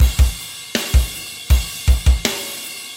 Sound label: metal